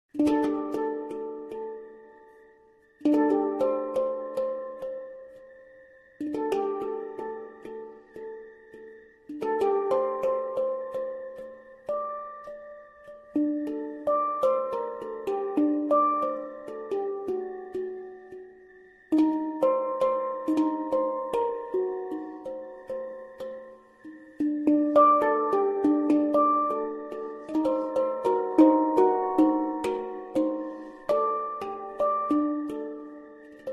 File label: High Jazz Intro